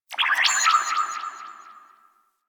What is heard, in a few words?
Corpus Ship Tech Chirps; Ambience; Warframe; Corpus Ship Remaster